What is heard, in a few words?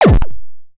enemy shoot; Season Land